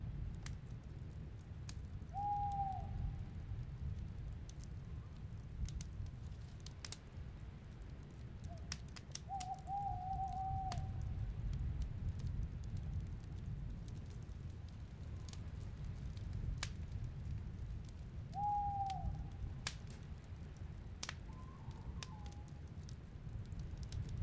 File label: SMOKE FIRE NIGHT; The 39 Steps